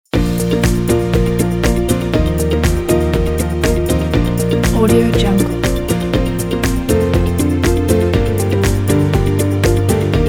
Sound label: inspiring corporate motivational epic